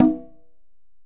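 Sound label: Conga Open, EMU PX-7 Drum Machines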